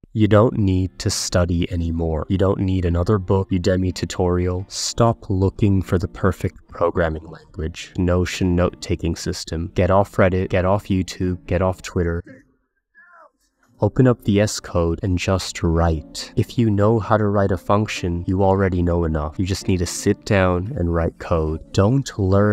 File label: Vocals